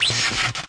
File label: Eerie Impulse; pokemon reborn e19 2022